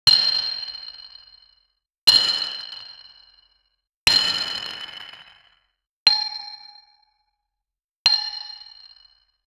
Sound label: retro 8 bit mining sound, chiptune